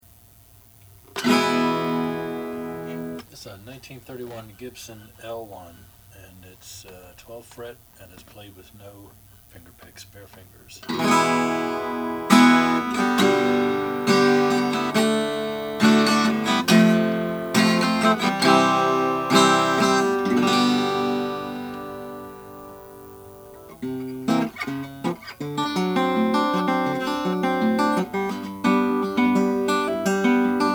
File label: gibson l 1 cremona sunburst